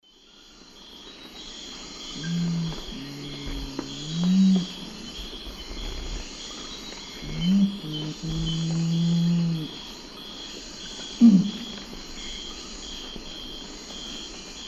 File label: Nothocrax urumutum, song; S. America; Eastern Lowlands